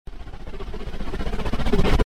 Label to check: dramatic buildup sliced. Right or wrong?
Right